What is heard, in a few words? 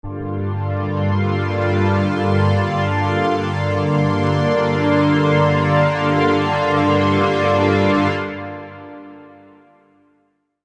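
Synth Pad G minor 7; Sonic Foundry (Sony Creative Software); Techno Synth Loops 1 Signals I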